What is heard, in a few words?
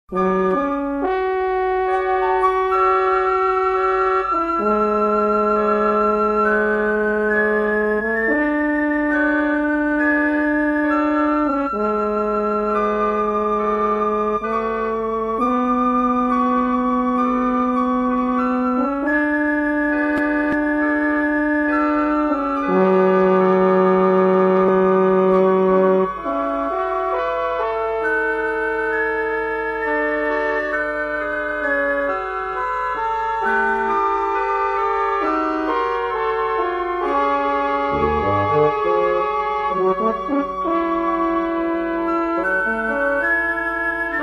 wind quintet; Quintette (For wind quintet.)(excerpts from Movements 2 and 3, below)